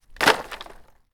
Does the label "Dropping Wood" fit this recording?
Yes